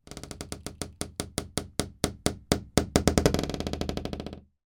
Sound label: door wooden internal creaking old